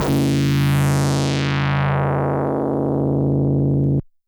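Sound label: DX100 C#3; rocket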